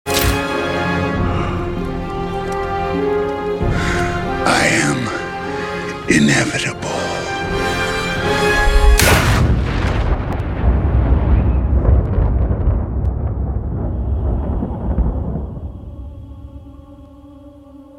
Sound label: Thanos gloves; دستکش تانوس